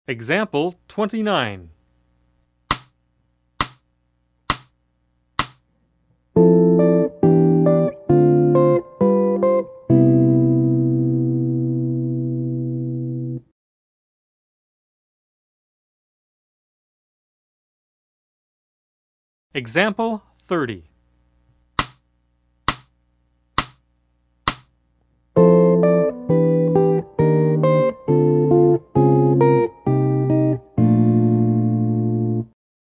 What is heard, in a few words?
Jazz; jue shi ji ta